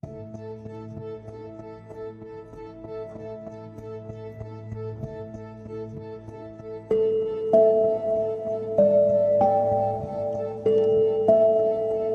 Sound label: Dreamy Bells